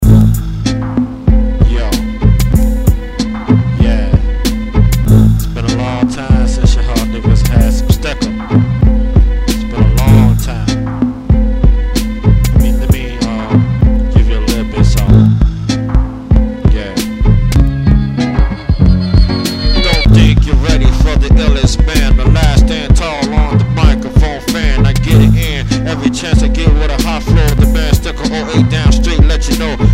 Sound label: vocals